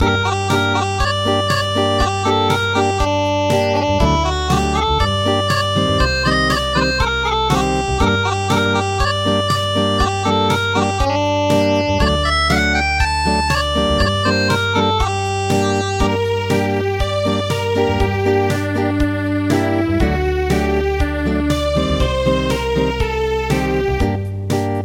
Acoustic; Canaries

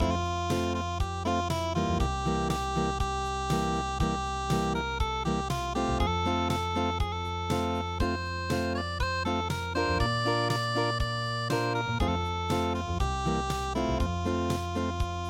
Nona manis siapa yang punya unpluged

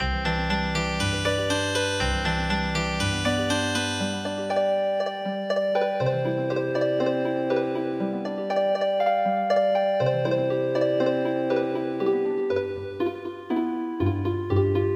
Healing Harp

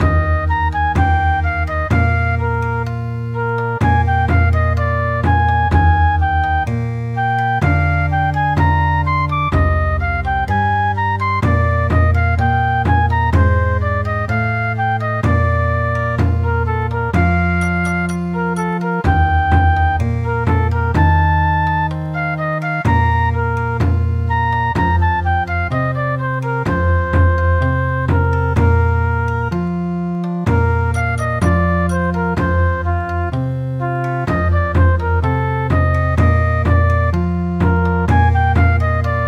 Bon Odori

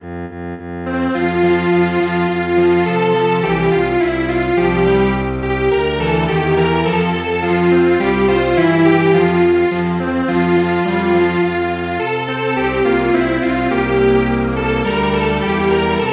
Strings Quartet; Morning tide

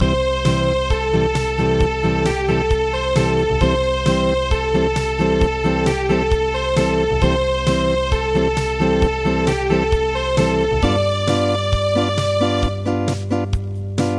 Acoustic

